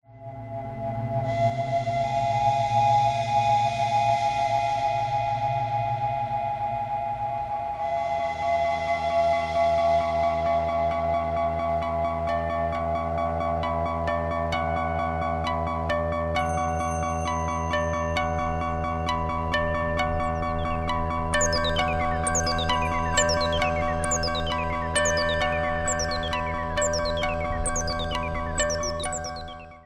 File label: Theremin; atelier Theremin